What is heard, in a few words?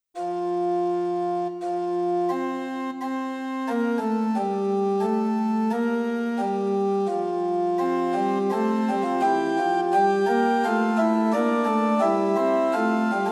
Short Organ Music Pitch 440 Temperament Werckmeister; Werckmeister 1-3; Prinz 8 4; hymn